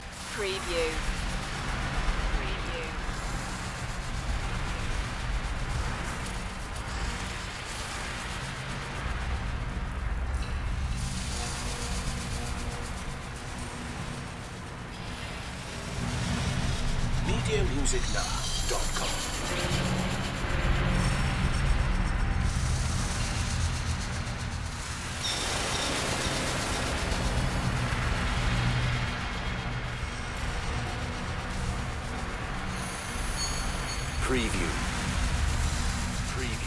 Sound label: windy electrostatic ambience loop